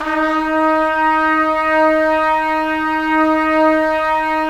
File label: Trumpets; Roland - LA Composer vol. 4